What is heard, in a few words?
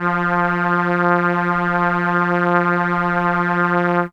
Horn F3, ARP Solina; musicradar-synth-samples